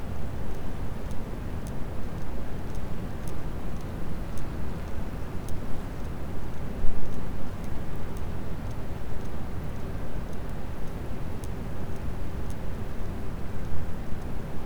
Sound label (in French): cachalot positif, Physeter Macrocephalus